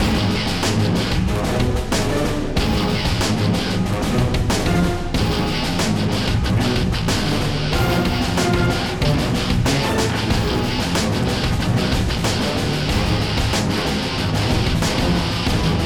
Combat Breakout

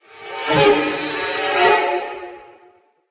Combat String Mix

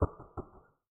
footsteps; Five Nights at Treasure Island 2020 Remake; windows

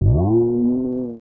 Cow; tin star; snes

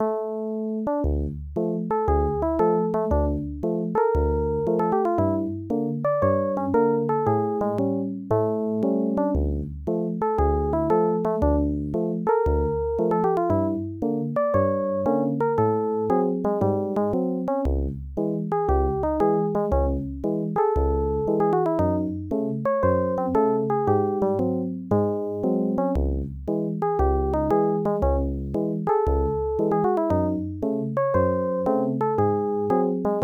Piano Player on Zeppelin; Indiana Jones and the Last Crusade The Graphic Adventure; IBM PC XT AT

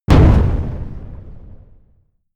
Medium Collision

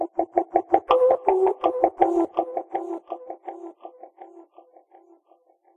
Trip Percussive; And Yet It Moves

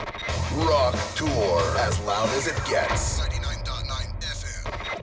Radio Rock Jingle; Gangstar Miami Vindication; android; macos

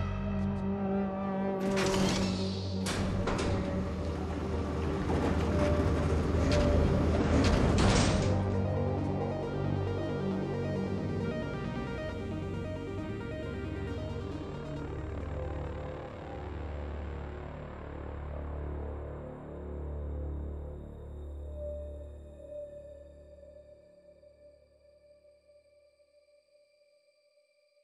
alarmed

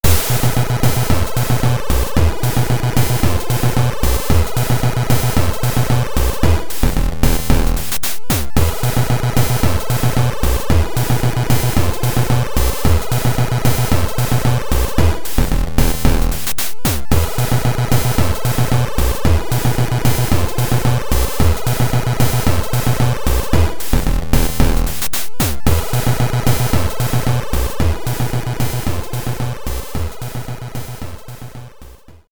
Dance Room; Shantae

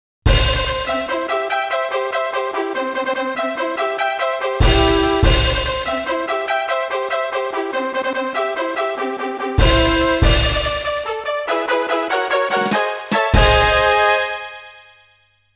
Fanfare - Big Prize Race; koei original bgm collection vol.13; winning post; winning post 2; Kanto

